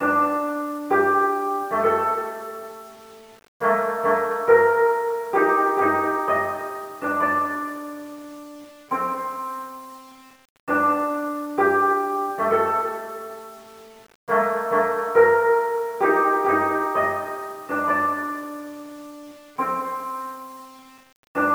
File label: bgm koto; Yakuza Ryu ga Gotoku Kiwami 2